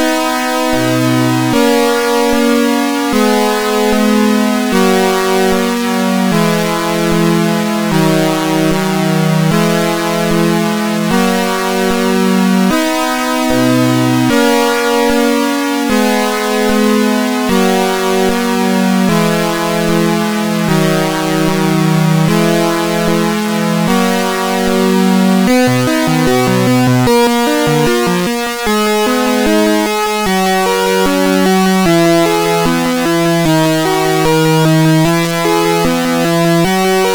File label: tune, sid, CSG8580R5